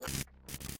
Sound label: win display; spinsane